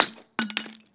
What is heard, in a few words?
bottle drop; vampire demo